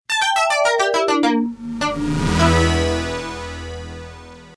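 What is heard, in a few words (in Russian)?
SMS уведомление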